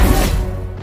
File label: 2 kills; valorant